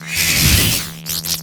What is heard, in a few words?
repair wait; Earth and Beyond; EA Games